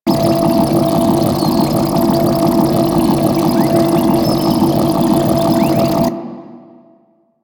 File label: Well Of Life Loop; Warframe; Warframes; Trinity